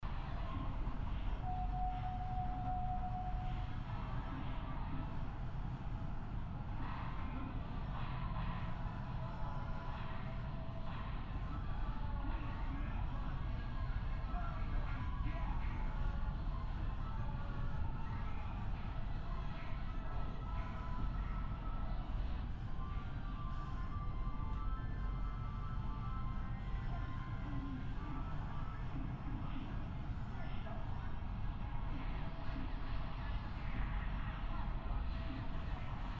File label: Barcade No Music Ambience; Class of 09 The Re-Up